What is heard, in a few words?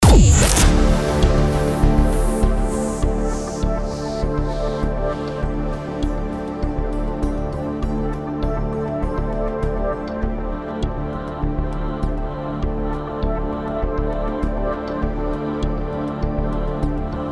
drone; 3FM Exclusive